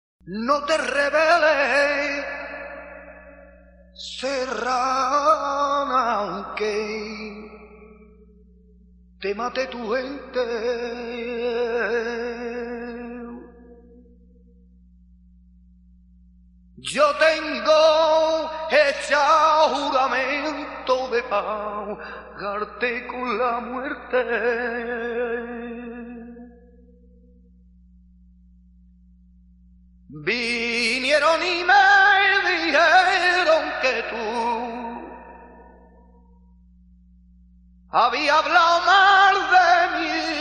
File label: Tona, flamenco